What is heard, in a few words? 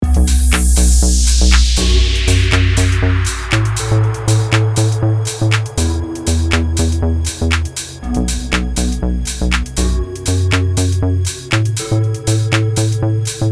Sinewave